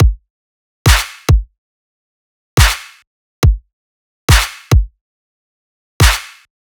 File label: Kick & Clap 140BPM, Dubstep Loops Drums; Ghosthack; Ghosthack - AC2024 - Day 13 - Dubstep Sounds 2025